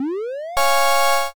summon

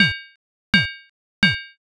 blacksmith

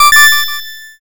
correct

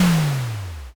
rock crush

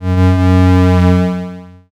creak

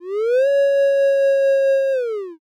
wolf howl

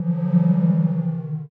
gakkari crowd

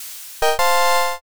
challenger

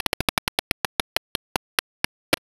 roulette

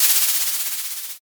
tent

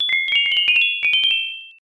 xmas bell

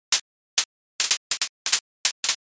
sparse clap

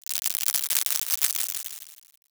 applause

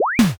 cut-in

